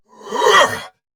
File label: Attack Effort